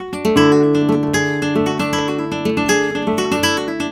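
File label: arpeggio guitar, Flamenco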